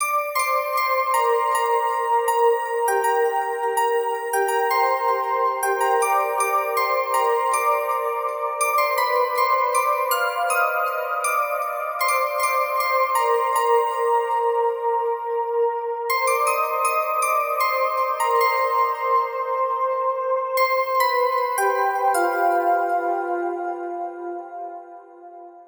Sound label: Bell Sphere Pad melody